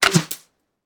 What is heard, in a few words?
Bullet Pass By; Sound Ideas; Guns